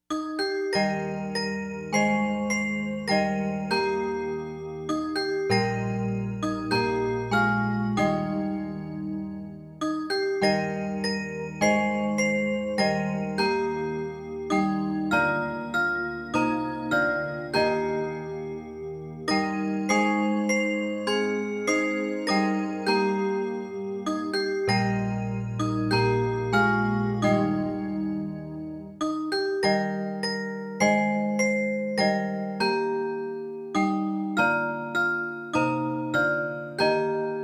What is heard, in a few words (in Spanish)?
oracion familiar musica solo